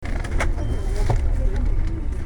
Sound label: clang rhythm, ambience